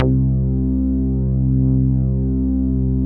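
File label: ATTACK BASS C3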